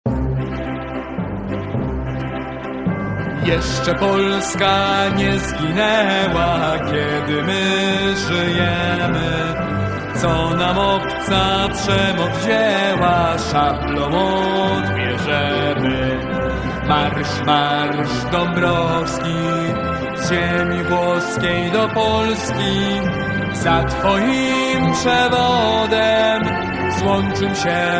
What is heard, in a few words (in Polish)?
Mazurek Dabrowskiego Hymn Polski